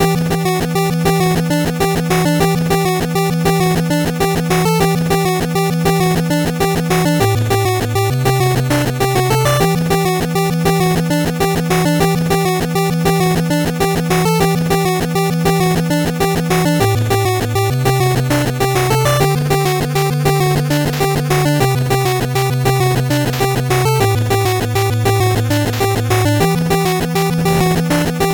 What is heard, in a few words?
8bit bgm fast